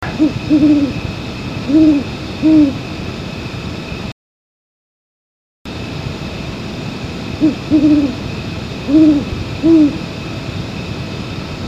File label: Great Horn typical male, owl